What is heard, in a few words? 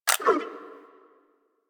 Akimbo Pistol Mech; Warframe; Weapons; Corpus; Briefcase